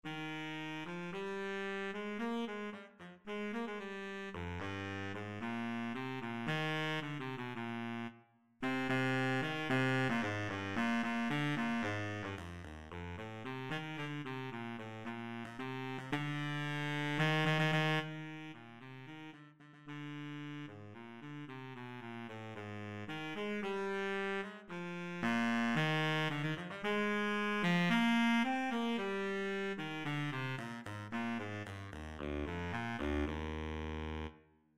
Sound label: Low Reeds Bari Sax; Audition